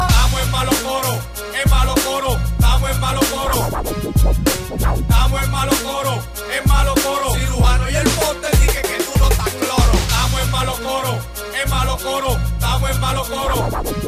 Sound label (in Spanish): Coro